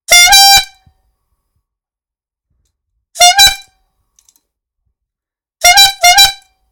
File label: Bike Horn